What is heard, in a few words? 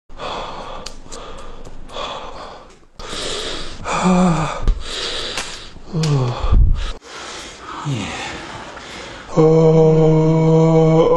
Man Noise